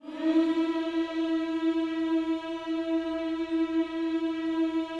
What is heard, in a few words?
HMMS E 4, GOSPEL HMM; Spectrasonics; Vocal Planet